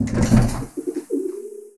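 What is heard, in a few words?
Bird Trapped wood; Project Zomboid v41.73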